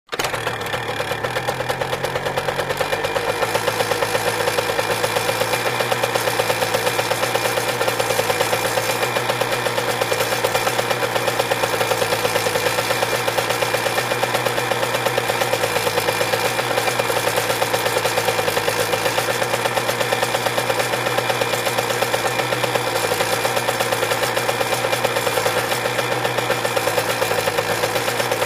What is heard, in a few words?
Motorcycle starts, waits, turns off